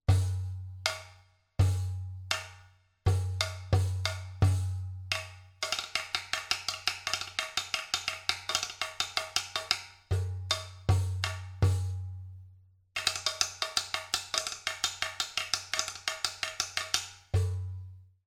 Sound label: drums; Storm Damage